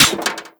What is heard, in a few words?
bullet metal grate